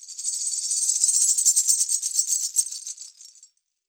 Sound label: wrist, world percussion; World Instruments 2